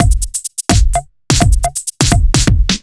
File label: Kit Heavy Swing, 85bpm; off the grid